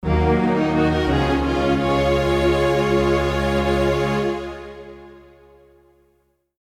Lose; dragon force

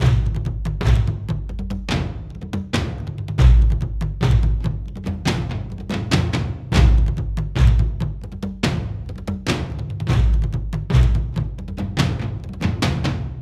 Drums; For Honor

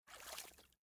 step in water; Out of Reach: Treasure Royale

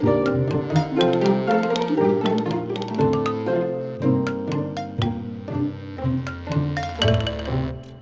classic xylo; music world

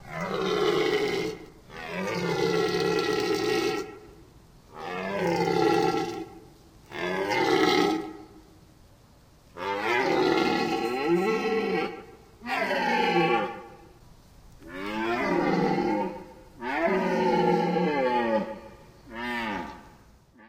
Idle Elk

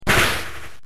normal damage; pokemon reborn e19 2022

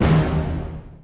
Combo Losing Hit; Duel

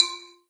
Combo Sound; candy crush saga gamerip

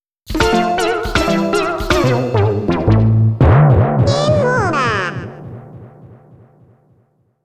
Game Over; vib-ripple; vib-ribbon

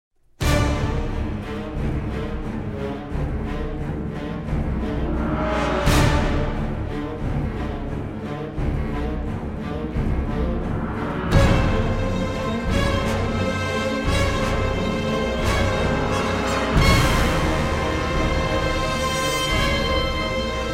Countdown; Heavy Rain

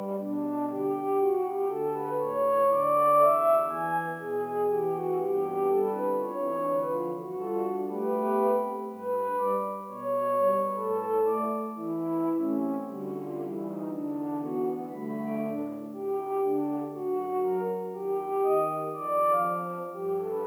Village Ambience Music

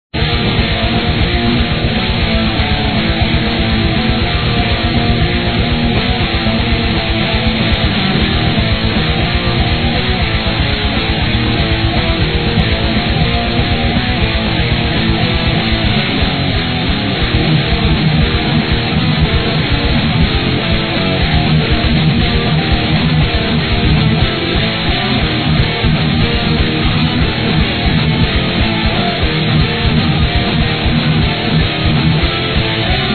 Menu Loop; Territory War Online

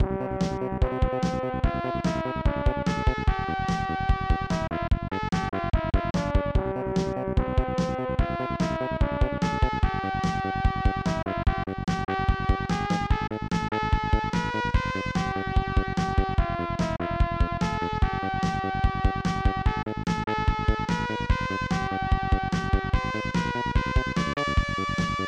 Level BGM; super widget; snes

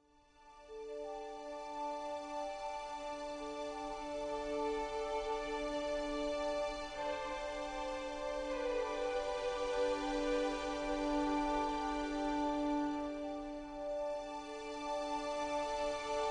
player turn nature; devils demons android game music

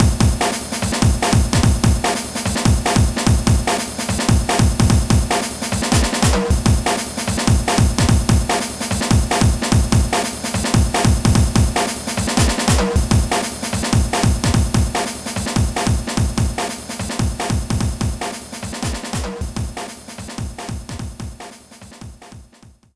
bgm sea battle boss

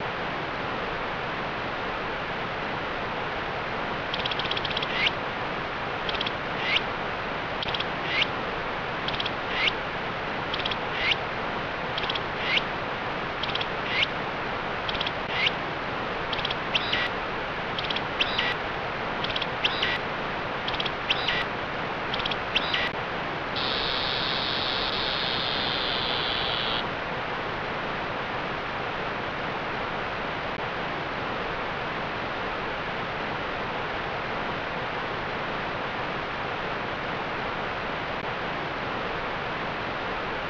Stream Cicada; kawa no nushi tsuri 2; snes

